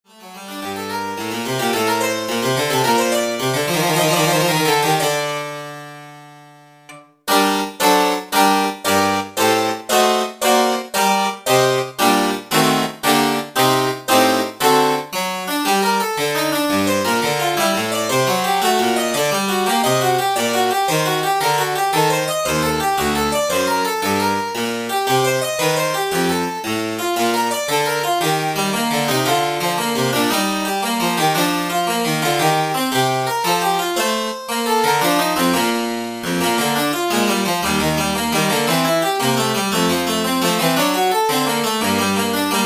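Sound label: flute sonata BWV 1030, oboe; bach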